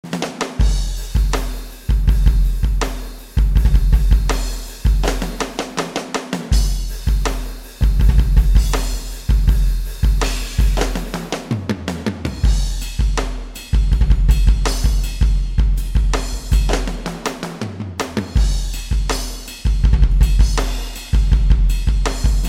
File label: Big Rock 1 KIT, xv-5080; SRX